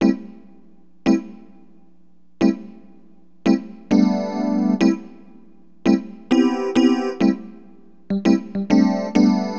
RHODES; Keyboards; LiveAct Pool; AcidJazz Funk

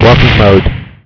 Walking mode; VirtCity

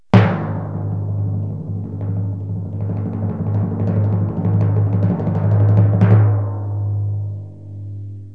Timpani Roll in G; Music; 16-bit; KABOOM! - Wild and Wacky Sound Software; 1,000 Sounds

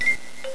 Apple Caught; Josh's Apple Game 1.0.1

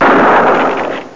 Old western Gun

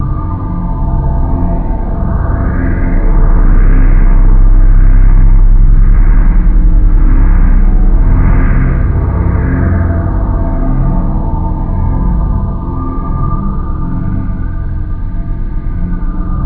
atmos Fear; sfx; MECHCMDR

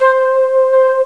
flute c5; Star Trek demo; Star Trek Audio

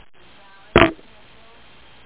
step

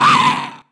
morf hit; DAoC

